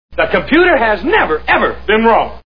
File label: computer; Beverly Hillbillies